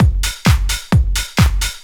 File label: drum 130 trance